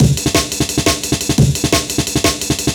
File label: DNB BREAK; m8 breaks